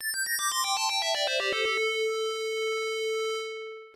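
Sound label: background music; Street Fighter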